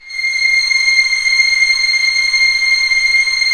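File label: STR UNI, violins; Roland - String Master Series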